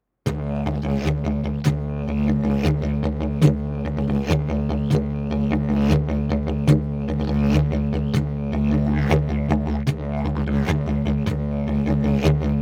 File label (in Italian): ritmo livello avanzato